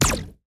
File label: Rapid Laser Fire